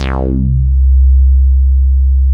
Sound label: BASS C3; JGL BASS; Jungle; USB Soundscan vol.05; Explosive Jungle; AKAI; 90s sample CD